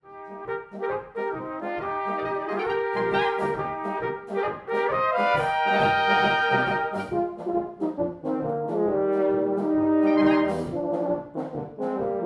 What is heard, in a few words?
Polka